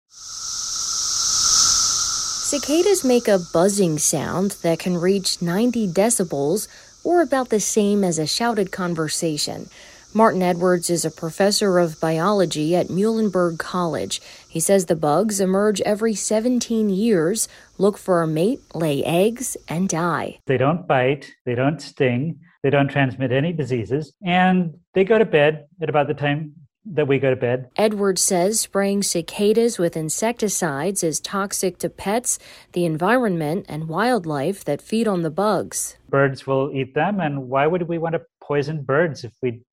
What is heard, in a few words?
spraying cicadas